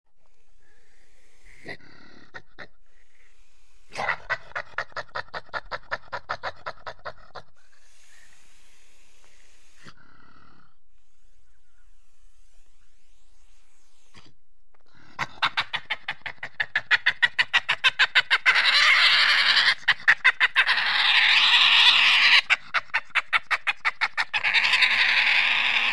Badger Grunts Barks, Badger Grunts, Barks With Angry Screams And Hisses; Close Perspective.